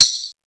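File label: TAMBOURINE, M1 DRUMS; East West; Global Sounds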